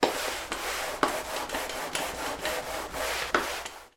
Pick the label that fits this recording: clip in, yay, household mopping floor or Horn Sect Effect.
household mopping floor